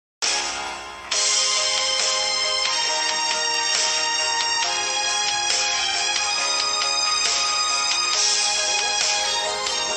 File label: Relaxed